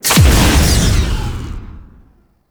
Sabotage Electric Pulse Shutdown; Gameplay; Kahl; Warframe; Veilbreaker; Devourer Kahl

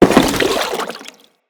Crewman Land Water, Footsteps; Enemies; Corpus

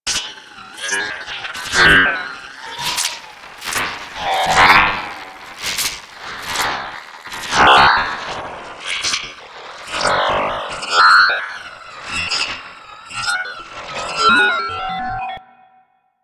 Leech Engine Layer; Leech Osprey

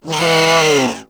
Kavat Vocal Death; Warframe; Khora

